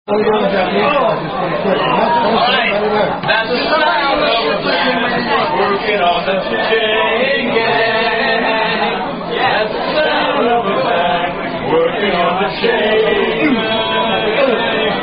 Chaingang Down-Down Song

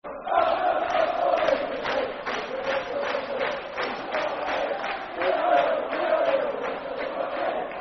Ei Ei Eio, Football Chant; Yeovil Town Ytfc; fanchants

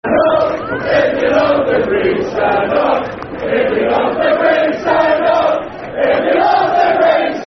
Stand Up If You Love The Greens, Football Chants; Plymouth Argyle Pafc

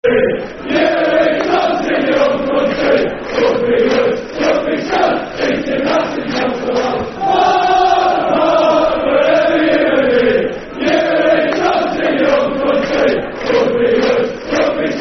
Football Chant (Soccer Song), Man United; Park Park Wherever You May Be

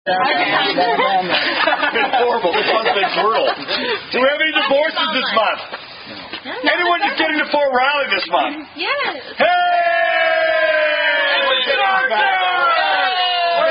Hash House Harrier Down-Down Song; Happy Birthday, Fuck You

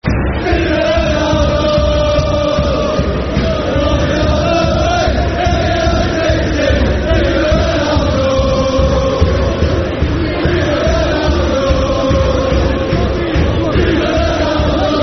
Football Chant (Soccer Song), Man United; Viva Ronaldo

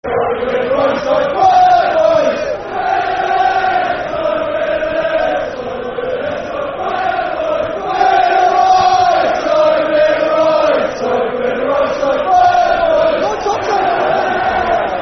Football Chant (Soccer Song), Brighton; We're The Right Side Brighton Boys; Brighton Bhafc

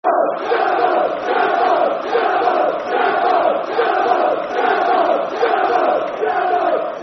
Zaaboub, Zaaboub Football Chant (Soccer Song); Walsall Wfc; fanchants

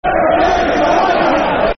Football Chant; Referee's A Wanker; Aston Villa Avfc

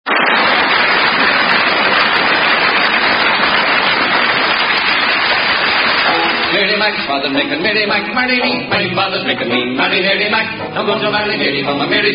Irish song; RenFair SCA

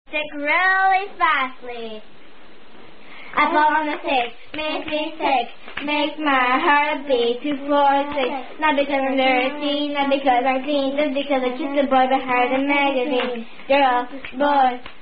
Fast Version, Children's Hand Clapping Rhyme; Apple On A Stick

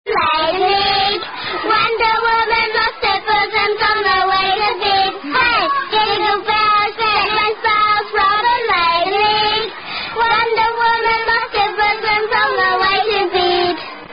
Jingle Bells; janice ackerley collection

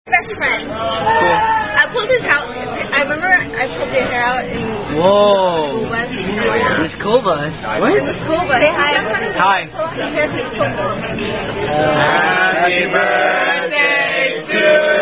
Happy Birthday (Standard With Cha-Cha-Cha)

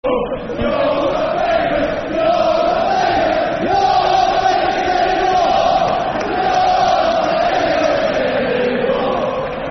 Football Chant, Soccer Songs; You're Not Famous Anymore; Hereford United Hufc

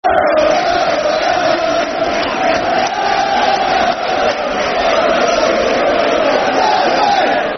Football Chant (Soccer Songs); Der Southend; Southend United Sufc

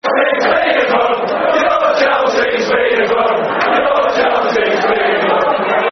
Football Chant; You're Chelsea's Feeder Club; Aston Villa Avfc

